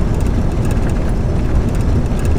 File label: Vehicle Light Tank Idle